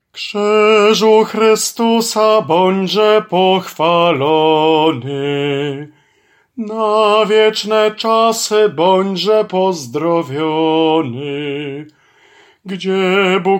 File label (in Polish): SOPRAN; Krzyzu Chrystusa